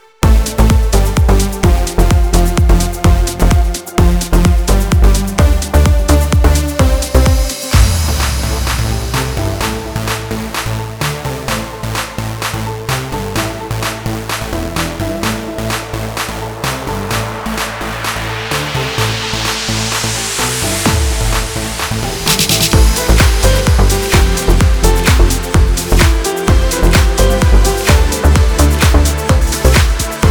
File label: house electronic techno; By Burak Sound Bank; house electronic techno vol 4